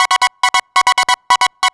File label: tek 138 b, Techno, hi-tone-synt